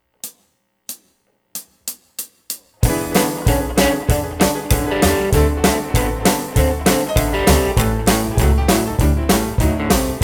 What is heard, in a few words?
harp, Quick Two Feel in A, blues